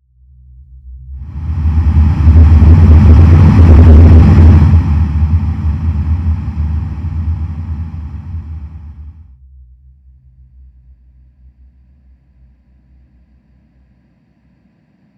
Loops mutantes

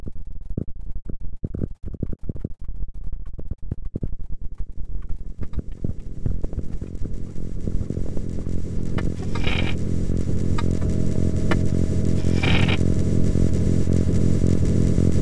granulando

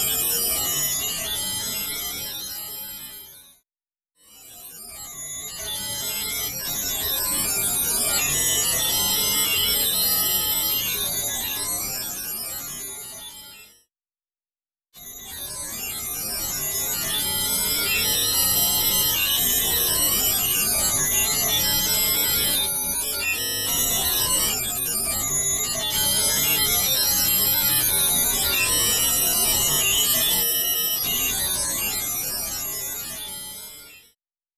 loop procesado freeze freqwarp

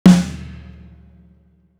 Loops mutantes

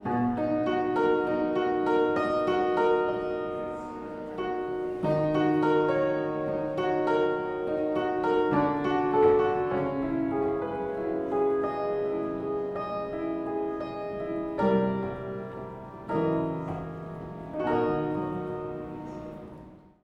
Loops mutantes piano cama

